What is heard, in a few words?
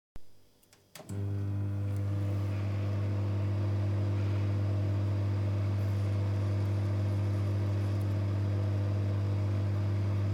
Microwave